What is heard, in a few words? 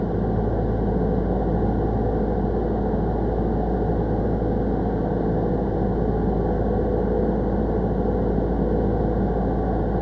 distance airplane idle; Hearts of Iron IV Death or Dishonor